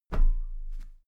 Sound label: step metal ladder step top soft; schritte